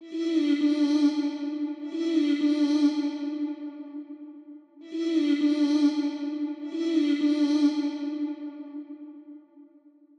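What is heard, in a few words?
100 G minor; GUILA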